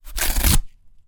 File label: Cardboard Tear